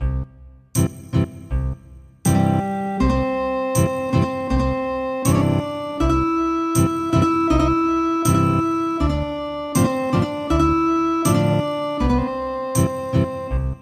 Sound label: Ethnic Guitar, traditional; Sayonara